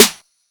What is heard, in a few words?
Snare, drums; Ableton Live 12 Lite Core Library